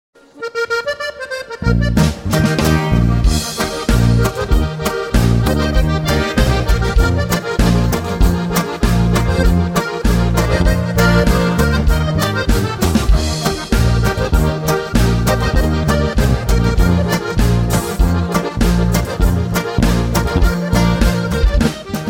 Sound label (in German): Boarisch